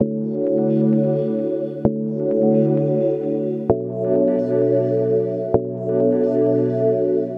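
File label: 130 D major Synth Late Night; Zero-G; Future Wave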